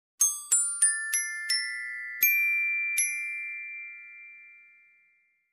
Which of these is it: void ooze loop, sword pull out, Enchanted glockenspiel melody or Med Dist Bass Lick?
Enchanted glockenspiel melody